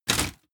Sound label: step armor fullplate